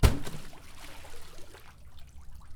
water slap

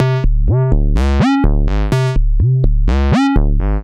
Slo Bass; Sonic Foundry (Sony Creative Software); Underground UK House & Electro

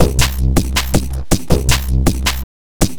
Beat, Futurist Drum & Bass; Drums & Percussion

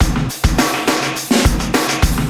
CB LOOP; Zero-G; Chemical Beats

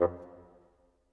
Bassoon, Orchestral; Sonic Foundry (Sony Creative Software); Orchestral VOL-2; Modern 12

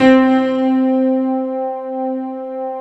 ISLAND PN

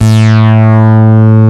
MOOG BASS; E-MU Formula 4000 Series Vol. 3; Analog Odyssey

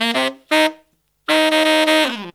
160 BPM, Horns

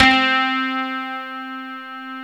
C4, Wurlitzer

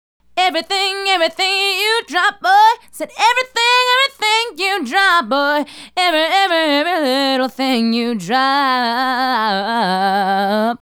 Female Solo, Hip-Hop and RnB Vocals

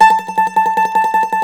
MANDOLIN

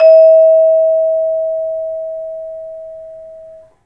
vibes; 3D Sound; 3D Keys VOL-1

